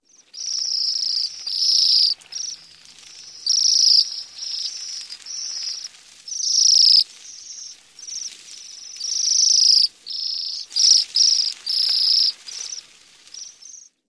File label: calls